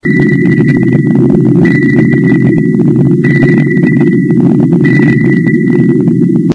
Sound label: pings; svencoop; tower6